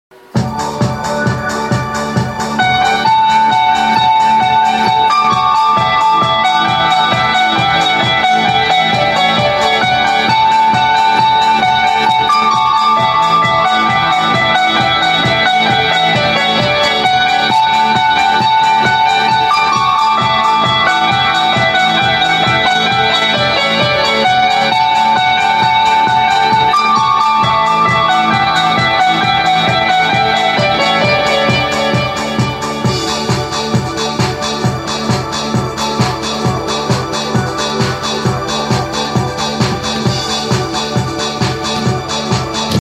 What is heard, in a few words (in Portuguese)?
vinheta sem voz; e so colocar a voz